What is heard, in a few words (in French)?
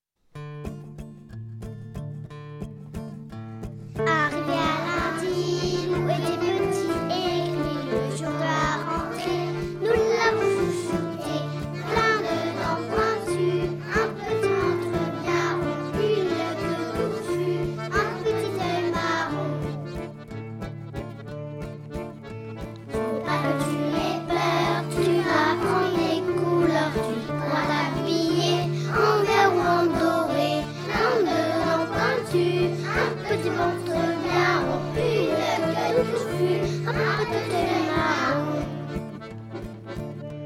Chanson de loup